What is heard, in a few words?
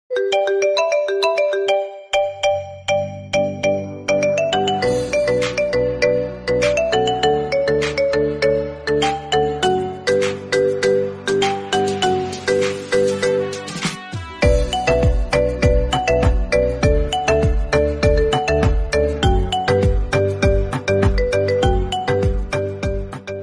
iPhone 15 Pro, Iphone Ringtones